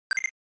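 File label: Game Boy General Pitch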